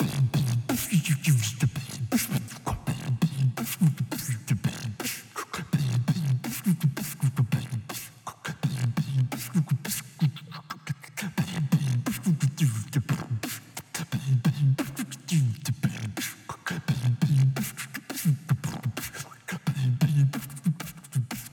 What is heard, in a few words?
beatbox; CrossCode; battle; beatbox-penguin